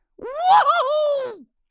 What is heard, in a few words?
yippie; Racetrack Riches; megaboard